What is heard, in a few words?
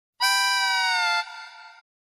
comedic suspense